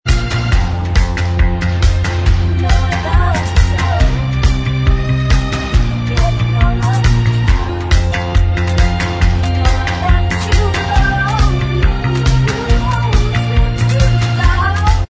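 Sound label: Backing Vocals Track, backing tracks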